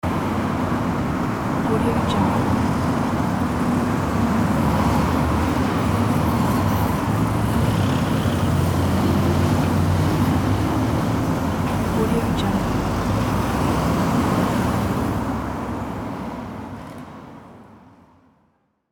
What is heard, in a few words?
city traffic on morning